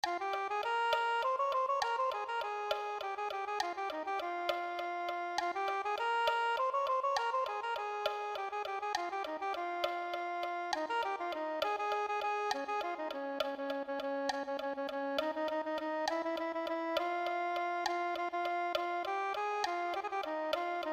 soprano saxophone

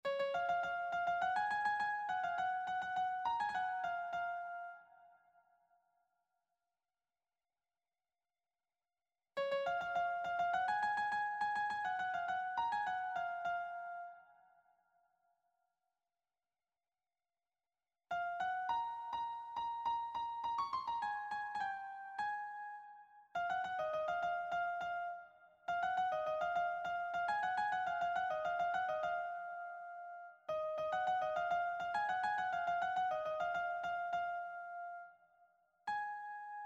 melody sheet, piano

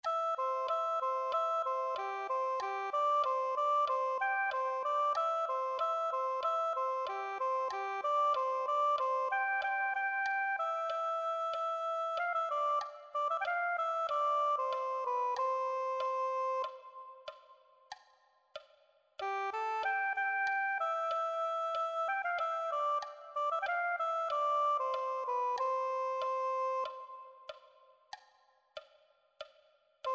soprano saxophone

